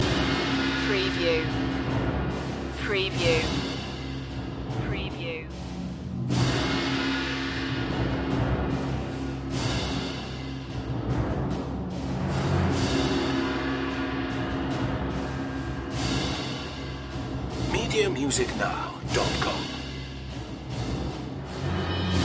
percussion loop

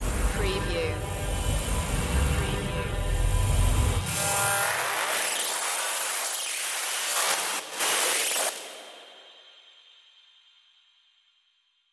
futuristic voltage glitch

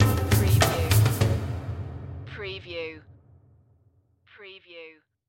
Musical

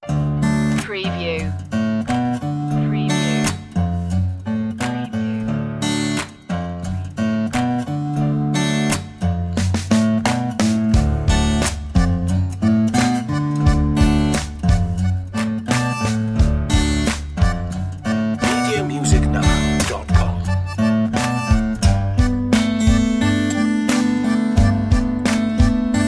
loosy bluesy no lead

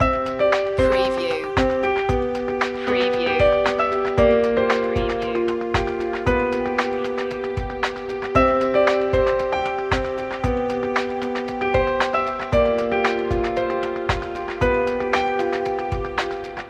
corporate achievement loop

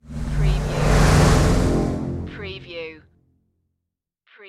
Dark Production Whoosh

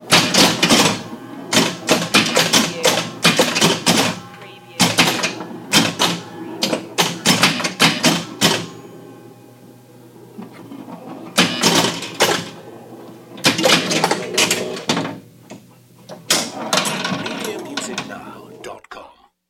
Playing Pinball

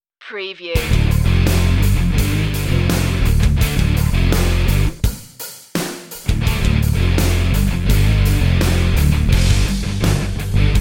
hot and nasty no lead

